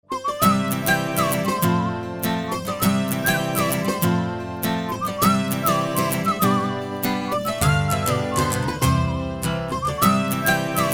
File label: jigle